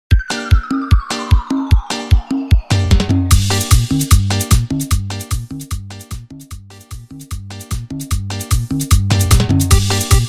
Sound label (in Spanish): pianos ec; Dimax Dj Mix; bpm 150